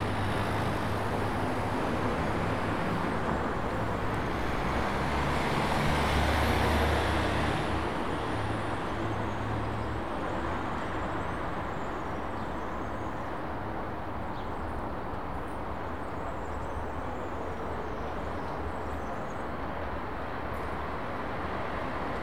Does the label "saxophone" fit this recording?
No